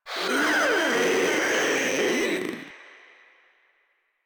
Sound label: Vocal Attack; Warframe; Proto Infested; Runner